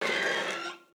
pet rex

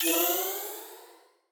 appear; upgrade

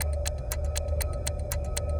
witch time loop

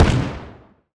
exposion enemy small; CrossCode; battle

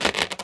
rubberpull, balloon; World Of Goo

